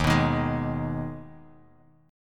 Eb Suspended 4th Sharp 5th down Bass Standard; Ebsus4#5 strummed; JGuitar

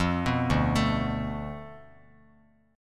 D Minor 6th Bass Standard; Dm6 arpeggiated; JGuitar

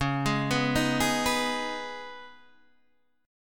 Db 7th Suspended 2nd, Guitar, Open D

